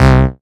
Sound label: Bass C, Offbeat Bass